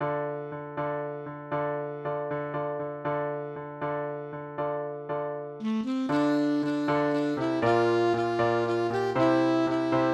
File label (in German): Klavier Kurz Mit Sax; Vorzähler, 3+2 Klicks; Klavierbegleitung mit Saxophon